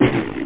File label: splat